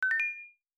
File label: Success Haptic; i OS; iOS10; nano